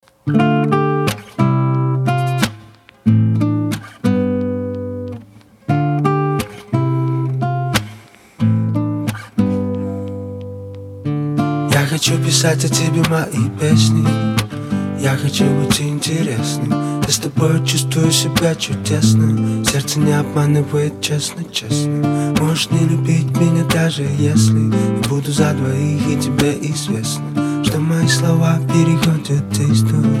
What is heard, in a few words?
Acoustic